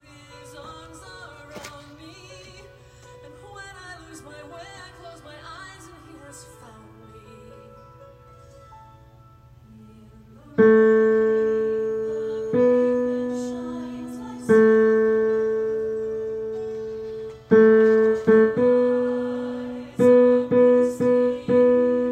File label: alto